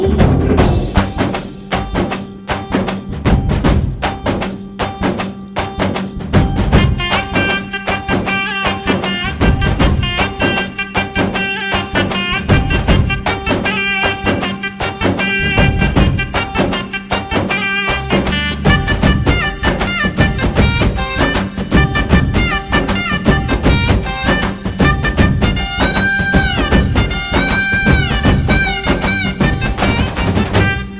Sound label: BG Beats